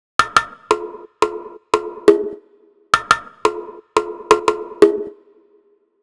PRCLP, Percussion Loops; neuro; Stanza; Drums

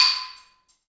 BALAFON; BALAMBO; Heart of Africa

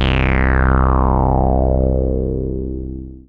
Single Electric Basses, Dance Floor Bass

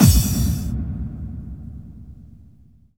BD-FX, Dance Mega Drums; Best Service

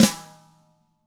DW FLM FD, Double Platinum Drums; ILIO

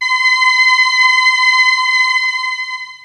MELLO STRINGS, Siel; TECHNO-BWARE

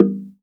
LOG DRUM HI, Drum Machines, Akai MPC-3000

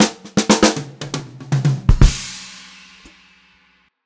FUNKY FILL, Funk-Groove Drumloops; USB Soundscan vol.38; AKAI; 90 s Sample CDs; 120 FUNKY

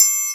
Trian; EMU Orbit-3

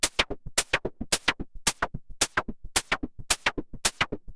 MiniBrute 110BPM, Gated Seq Noise

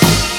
HIP HOP; Rubber Chicken Software Sample Bank

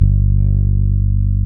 Fretless Bass

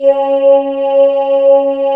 Voc; Best Service Gigapack 1; VOICE 3

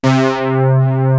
POLY SYN; East West; Global Sounds; MKS

